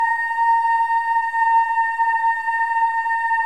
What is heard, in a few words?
BOYS EES, Symphony of Voices